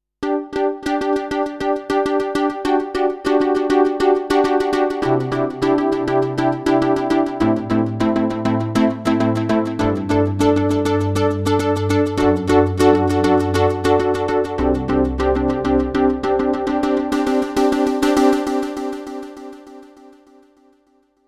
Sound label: yamaha tyros5 softstepper